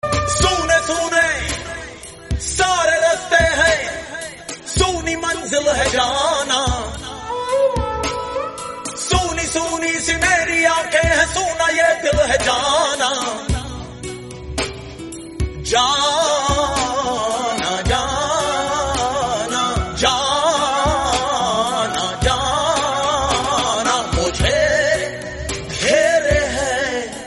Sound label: ring tone